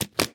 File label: Suitcase Open; Peace, Death